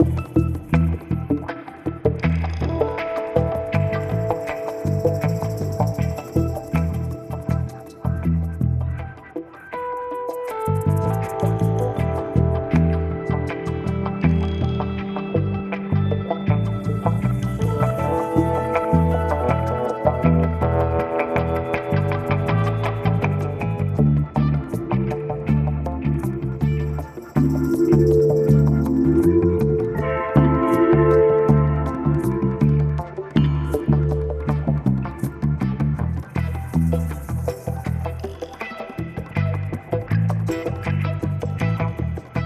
Map Funk

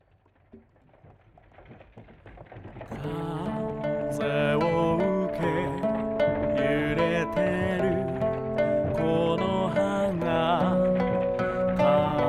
The Singing Voice of Trees; Ar tonelico Hymmnos Musical Spica: A Heart Crafting Gift

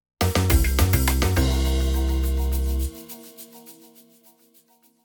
lose stinger; Wish

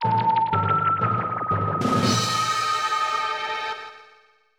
Out Of This World Realm Fanfare; Glover; Nintendo 64

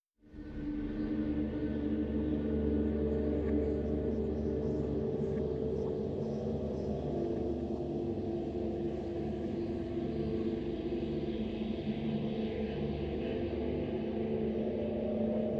Investigation Ambience; Geralt; The Witcher 3 Wild Hunt

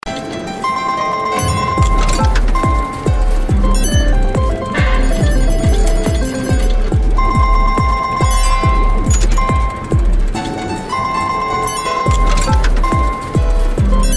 music result screen; dragon fin soup

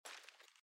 armour Light Step

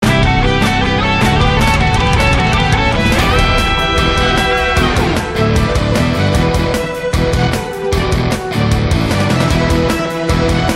BGM Scene Press to start; Turbine Fighter; Animoca Brands; Android

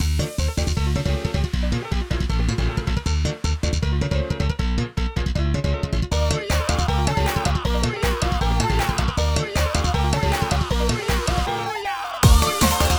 Instrumental

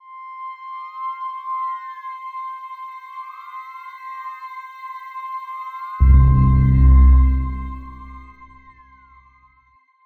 Transition End; Everspace 2

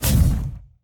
puff smoke

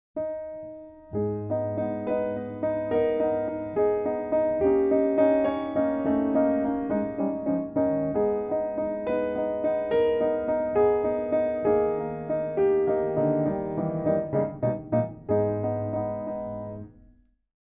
Pathetique Piano Sonata No.8; Takt Op. Destiny

